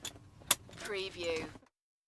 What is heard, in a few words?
Helicopter Latch Seat Belt